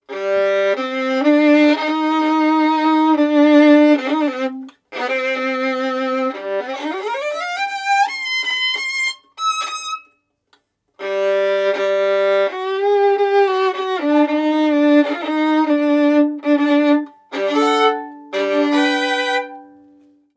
Baroque Bow Gut

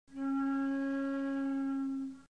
D4 p baroque, flute

